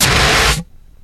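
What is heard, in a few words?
Water Through A Hose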